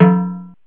hi cowbell; Drum Hits

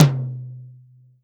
Roland R-5 Dry Tom, Drums

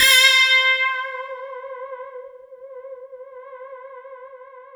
C5, SynthLine; 90 s Sample CDs; NiceBeats; SynthLine VOL-16

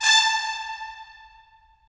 BRS, Tps Stacc; Roland - LA Composer vol. 4

